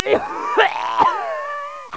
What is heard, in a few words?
GAG; Leisure Suit Larry 6 - Shape Up or Slip Out; Floppy DOS; scummvm